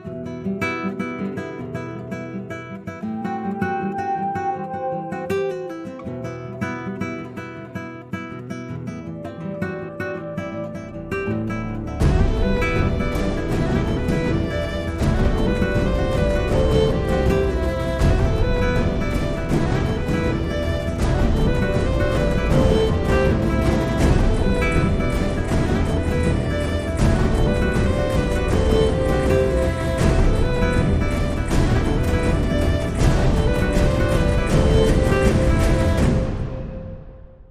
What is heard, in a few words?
Alt Percussion